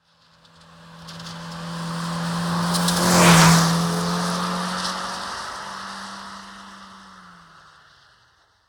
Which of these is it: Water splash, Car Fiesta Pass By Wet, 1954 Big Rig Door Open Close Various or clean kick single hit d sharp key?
Car Fiesta Pass By Wet